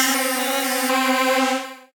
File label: Motion Swarm, Synth Rhythmic; Ableton; Glitch and Wash